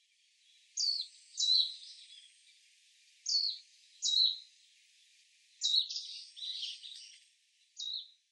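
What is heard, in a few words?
Japanese White-eye, miyake; mejiro; koe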